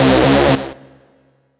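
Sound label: Rave Synth, Dance Mega Jungle Rave; Best Service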